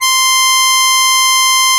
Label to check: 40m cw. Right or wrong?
Wrong